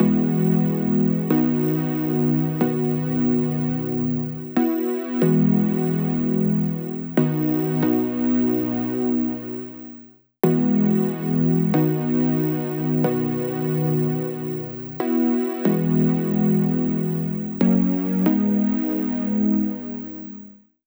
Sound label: Chords, Melody Loops; TRUTH-HZ; Crowdsourced Sample Pack